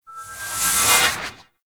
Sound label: Melee Heavy Attack Large Charge; Warframe; Weapons; Whooshes; Heavy Attacks